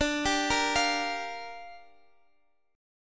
A sharp 6th D

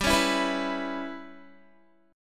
G 6th Flat 5th, Guitar; JGuitar; strummed

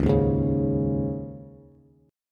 Db Suspended 2nd, Bass; 9,6,6,6; strummed

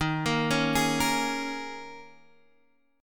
Bb Minor 7th, Eb; arpeggiated; chord shape

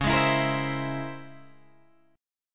Db Minor Major 13th Db Guitar DADGAD; DbmM13 strummed; JGuitar

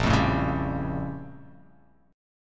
Bb Minor Major 13th Bb; BbmM13; strummed; x,1,3,2,1,2,x,x; JGuitar

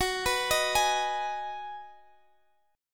Ab Minor 7th Gb

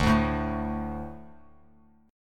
D 5th D, down, Bass Standard; D5 strummed; JGuitar

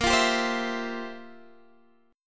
F Major 7th Suspended 2nd Suspended 4th; x,13,15,12,13,12; chord shape

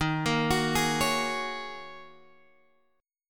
Eb Minor 11th Eb down Guitar Open G

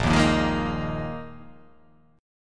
A sharp 7th Suspended 4th A sharp

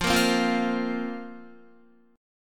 F Suspended 4th F down Guitar; Fsus4; strummed; 4E,4A,5D,5G,5C,6F

